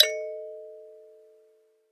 Thumb, Natural Elements, notifications; Google; Google Sounds

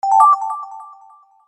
Win, notifications; Infinix Smart 2 HD

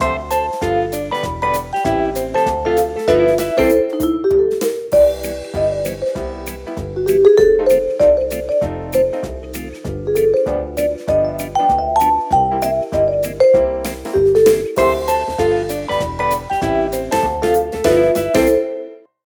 rain rhythm; ZTE nubia N1; alarms

